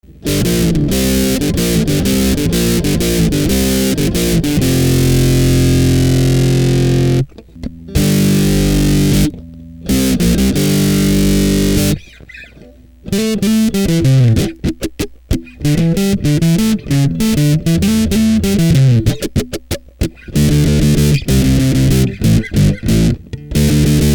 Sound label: overdrive pro; AMZ Overdrive Pro